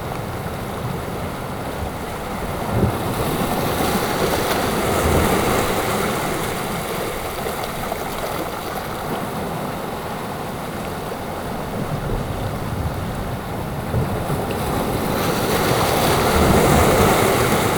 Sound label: wave